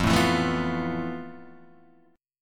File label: F Major 7th Flat 5th; strummed; FM7b5; 1,0,2,2,0,1; JGuitar